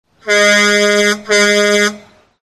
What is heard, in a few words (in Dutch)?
toeter vrachtwagen; ZhenShiXunYouJi2